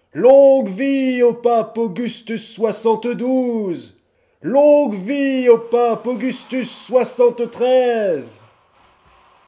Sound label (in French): temoin foule longue vie au pape